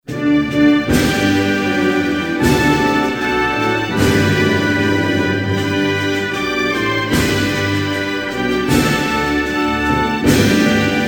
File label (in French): Zimbabwe, Hymnes nationaux